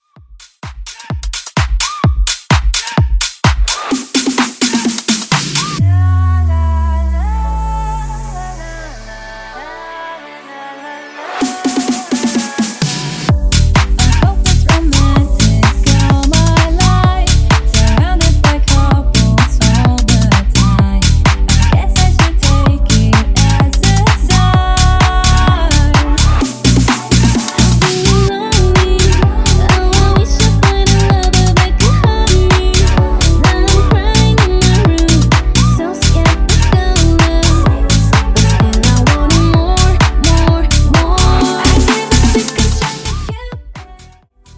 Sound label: Top40 Bounce Mix